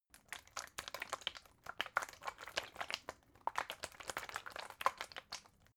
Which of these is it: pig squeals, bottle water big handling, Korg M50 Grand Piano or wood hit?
bottle water big handling